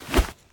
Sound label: Player Grab Start